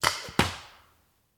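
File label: Paintball Gun Impact; Sound Ideas; Guns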